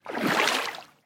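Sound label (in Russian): splashing of raging warm sea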